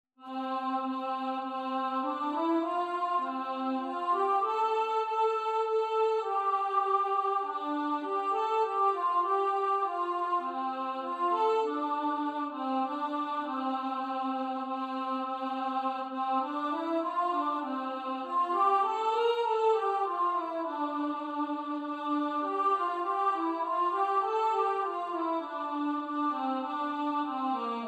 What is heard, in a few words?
Away In A Manger Alto